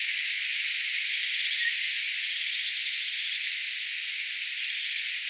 tuollainen punatulkun aani

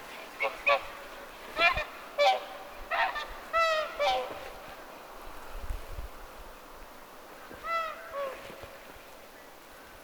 haarapääskysen pesäpaikan laulujoutsenperhe lentää ylitse; haarapääskysen pesäpaikan laulujoutsenperhe lentää ylitse, 2

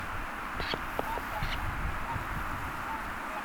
suippopyrstokurppalaji tietaakseni

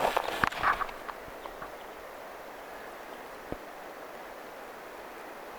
jotain käpylintuja en osaa tunnistaa että minkälainen käpylintulaji ääntelee, joku käpylintulaji lentää

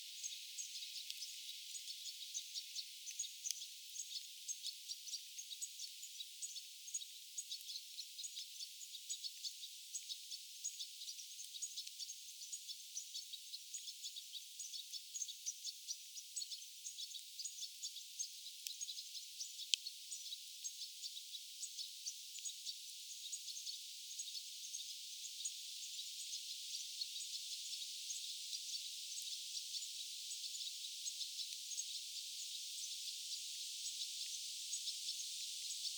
tiklipesueen aantelya; kesan toisen ehka juuri pesastaan lentoon lahteneen

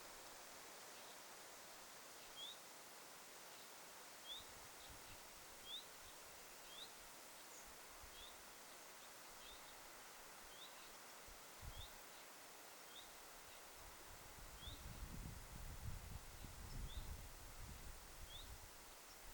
hyit-tiltaltti

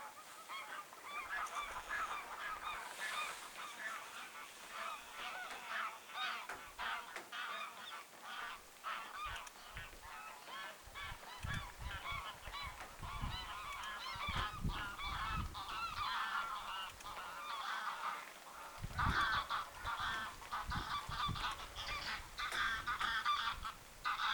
kotihanhien aantelya

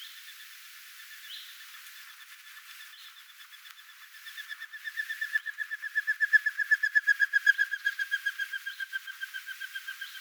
telkan lennossa siipien aantelya

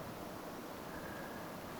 tilhi jalleen

